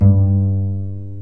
Plucked Bass G3, Upright Bass Plucks

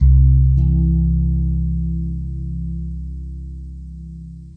two phasing bass notes; Basses

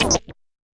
fx drum

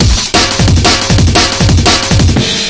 178 break, DnB Breaks; 141 to 185 bpm; Ethex

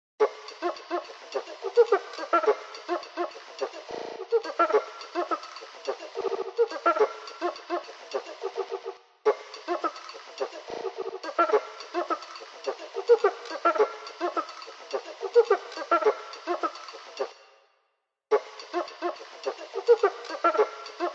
106 bleepy weirdo voxy; bleepy mahem

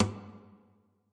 Nylon Hit, Guitar Body and String Hits

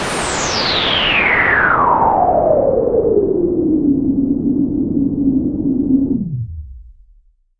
noise SH101, Noise Sweeps

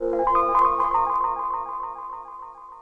soft hipass echo piano; KEYS ORGAN PIANO

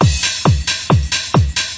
135 loud electro techno, drum loops

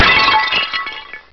Glass Breaking, cheesy lo-fi

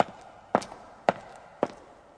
footstep reverb beat, 110 bpm, drum loop; 101 to 110 bpm

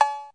808 agogo; Western and Latin Percussion; single hits

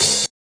hat

